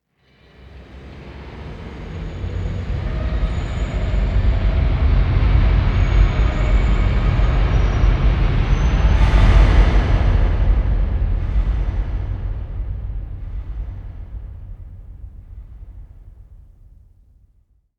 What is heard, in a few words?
Pillar Wet